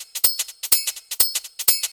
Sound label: top loop ride bell techno; drum loops